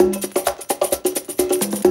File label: Perc, Percussion; Sound & Vision - Gigapack I